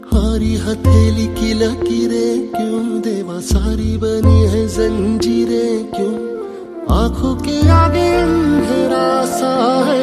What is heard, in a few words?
Sad Ringtone